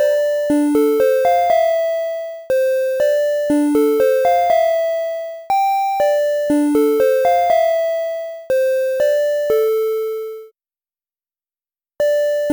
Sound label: Siren Boss; Adventure in the Tower of Flight